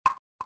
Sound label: shotgun, casings; bullet impacts; Soldier Of Fortune II Double Helix; XBOX